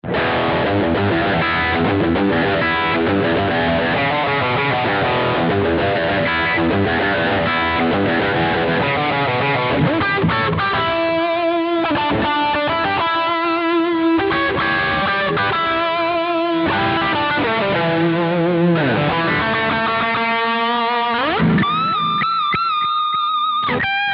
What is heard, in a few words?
GT-10; boss gt 1000 amp simulations